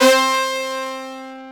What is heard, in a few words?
OB, OB modular; Greytsounds VOL-1